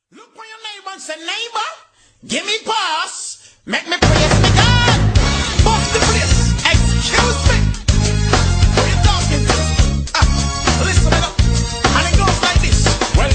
pop style

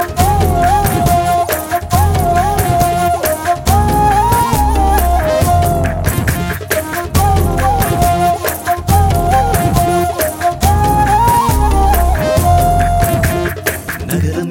sms bgm

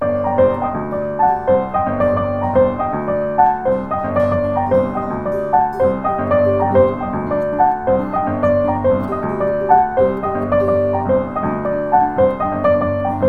extra salsa flavor; latino

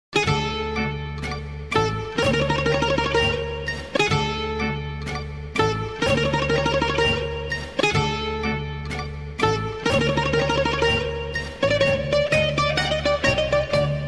greek tone; country

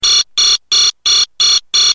Quick Bell Alarm

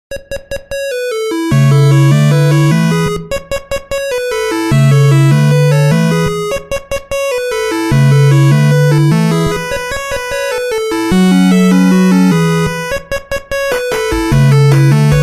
8 Bit, ringtones

